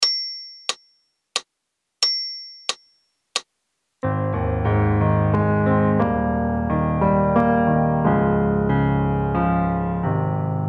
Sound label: in Eb Slow waltz count in, brass; backing tracks